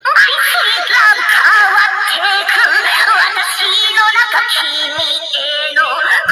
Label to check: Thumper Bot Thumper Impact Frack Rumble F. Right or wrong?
Wrong